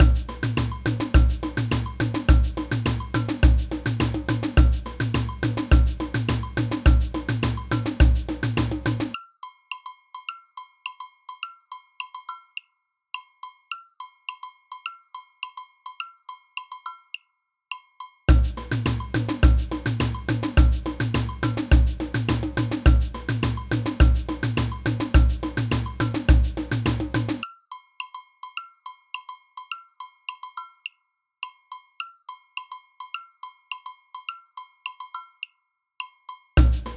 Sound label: agogo bells, samba; samba band